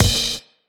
vinyl crash, Drums

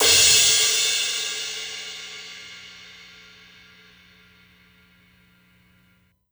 CRASH, Crashes & Cymbals; Percussion Samples; Drumkit

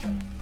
perc, lofi kit, percs; x.shulz lofi kit

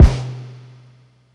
live kick; Kicks; drumkit

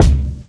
Break kick; Kicks; drumkit

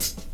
hihat, lofi kit, hats; x.shulz lofi kit

